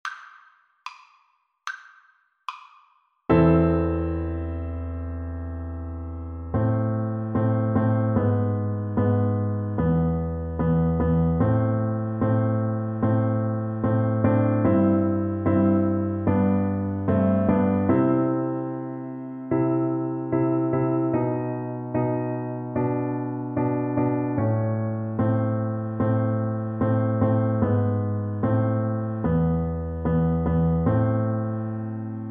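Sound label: vibraphone, Percussion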